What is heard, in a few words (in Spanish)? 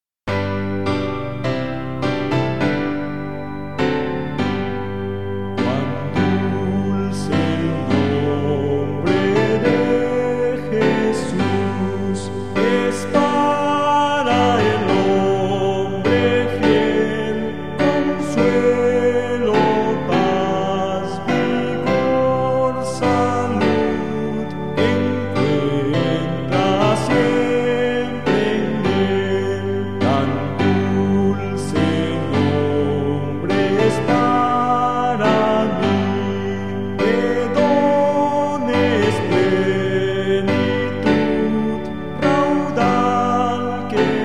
Melodia y palabras Cuan dulce el nombre de Jesus otra melodia